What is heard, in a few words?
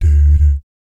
BS D-D, Vocal Jazz FX; Spectrasonics; Vocal Planet; JAZZCOMBO